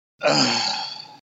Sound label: Guy Sighing